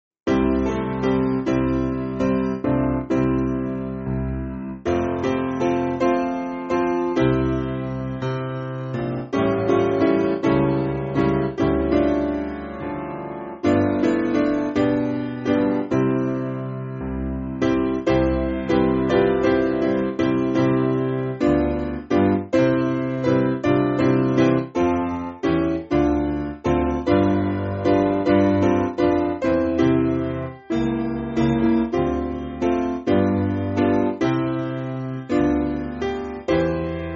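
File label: Piano, Music Backing Files for Churches; Why Should I Feel; Sparrow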